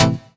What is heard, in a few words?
Synths A; Vengeance - Essential Clubsounds Vol.2